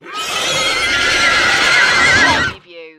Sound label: Monster Demon Horse